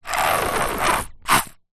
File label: household shampoo bottle squeeze little out squelch